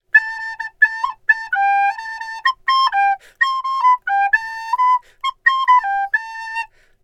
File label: Flute Player Melody; The Witcher 3 Wild Hunt